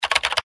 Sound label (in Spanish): Teclas